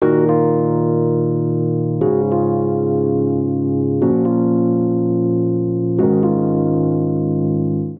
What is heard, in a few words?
Progression Sombre i - VII - v - IV Eb Minor 120 bpm, Electric Keyboards; Ableton; Factory Packs